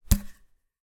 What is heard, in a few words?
sport cricket bat catch in hand